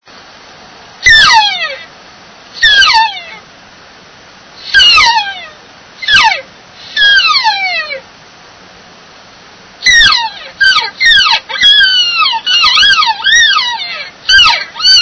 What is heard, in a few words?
cow, elk; elk 2001